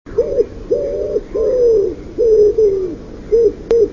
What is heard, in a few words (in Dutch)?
houtduif